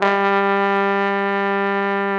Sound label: BRA TROMBO, Trombone; Sound & Vision - Gigapack II CD 1